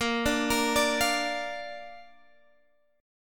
A sharp Major, Guitar, Standard; arpeggiated; x,13,12,15,15,13; chord; JGuitar

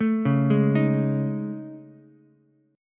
A Major Sharp 11th, Ukulele, C6; AM#11; arpeggiated; JGuitar

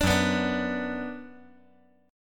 F sharp Suspended 4th Sharp 5th; strummed; JGuitar

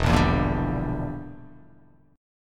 E Minor 7th Flat 5th E down

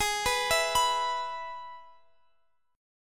Ab Minor Sharp 5th Ab

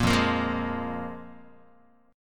F Minor Double Flat 5th F Guitar; Fmbb5 strummed; JGuitar

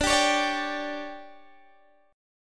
D-Major 7th Suspended 4th, D; strummed; x,17,17,18,15,15; chord

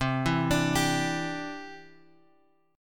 C Major Add 9th, Guitar Open G

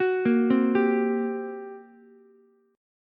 G Minor Major 7th Double Flat 5th, G, Ukulele; arpeggiated; JGuitar

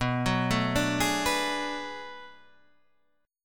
B Minor 13th B Guitar Half Step Down, chord

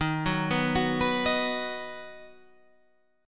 Eb Minor Sharp 5th, down, Guitar, Standard; Ebm#5 arpeggiated; JGuitar

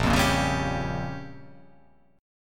A sharp Minor 6th A sharp down, chord

